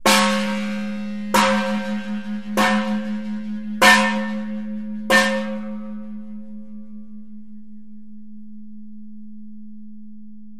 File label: Khmer Temple Bell, bell towers